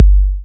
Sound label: Bassdrum